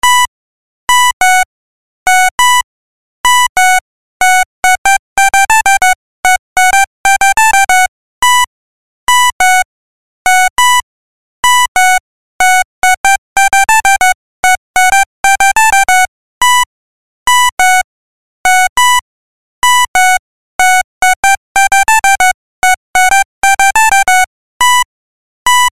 polka

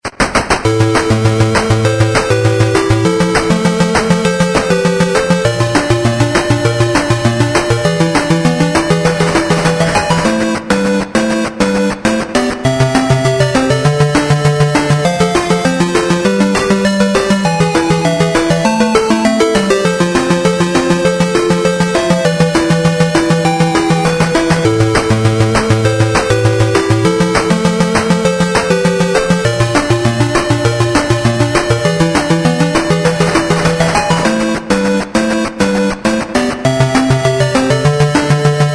sega game gear; shinobi

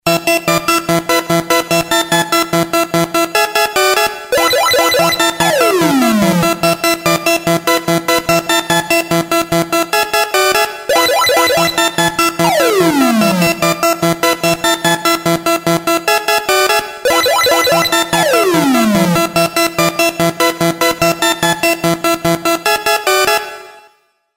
arcade; snk; fantasy; 1981

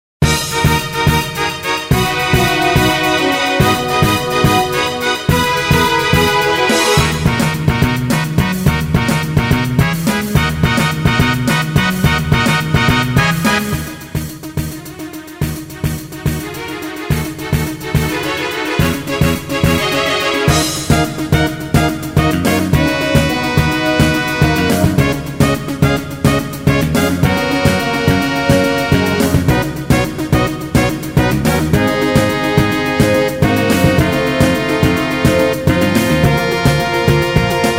combat; final fantasy x; ps2; 2001

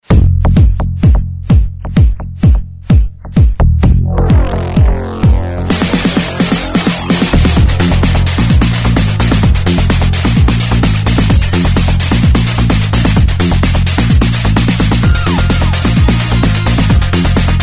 ringtone; streets of rage

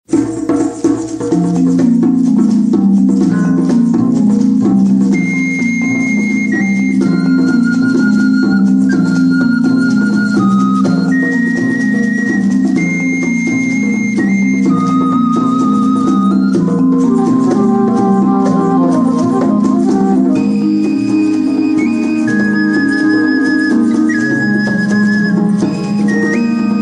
ringtone; roots

